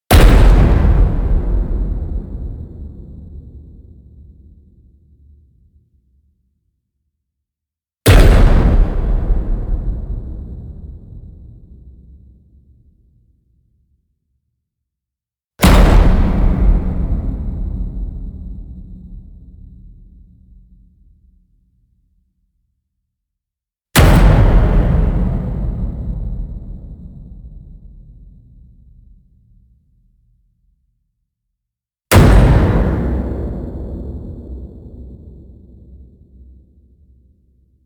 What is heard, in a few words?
hit metalwood